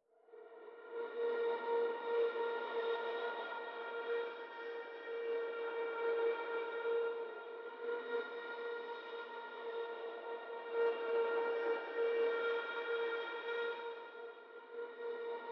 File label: 139 BPM A# Min Ambience; Cymatics; Drill; Savior